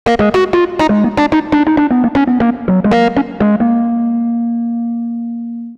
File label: Rhodes Solo, Cinematic Funk; Sony Creative Software; Cinematic Funk Urban Tone Poems